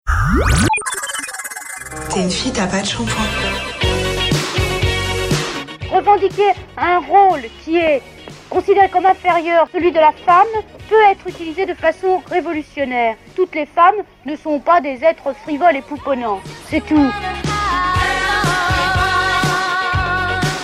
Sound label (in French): jingle femmes; nuit du genre